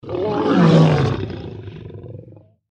deathclaw pain; npc

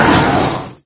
pain head, wraith; doom3

